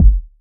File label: deep kickdrum sound g key